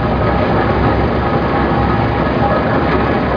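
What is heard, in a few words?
conveyor ambience; MOTS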